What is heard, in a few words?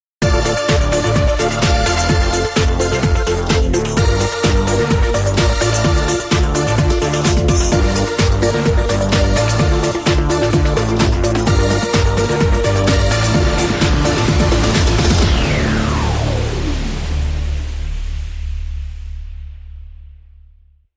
Trance Euro